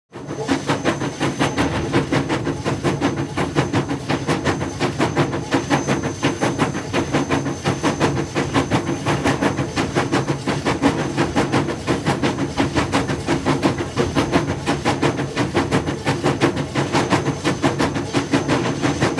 Pyhtit parovoz vysokie oboroty

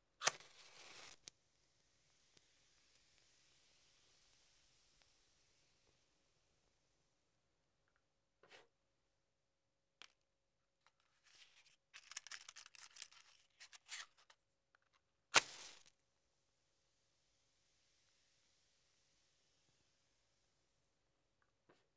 Spichki

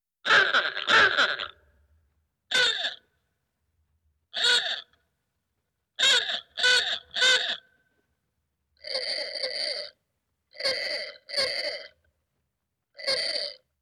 Klakson

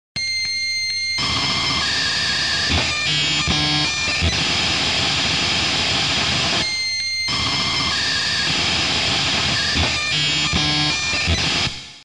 Modem dial-ap

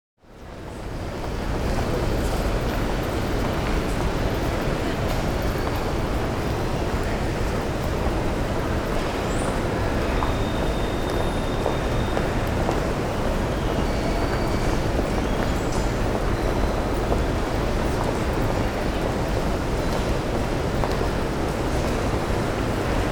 Vokzal zheleznodorozhnaya stancciya

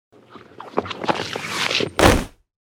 Podskolznulsya

